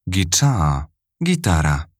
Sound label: guitar